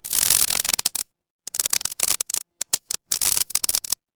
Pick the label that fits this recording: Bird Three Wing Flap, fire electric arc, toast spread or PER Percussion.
fire electric arc